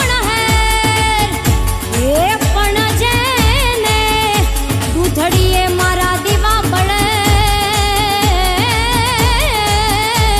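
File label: Gujarati ringtone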